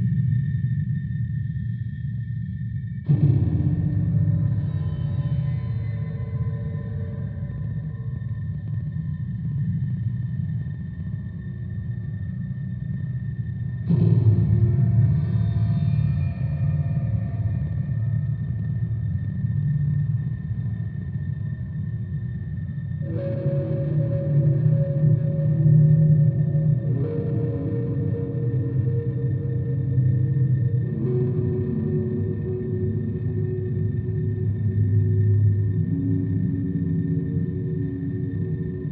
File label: Relaxing frutiger ambient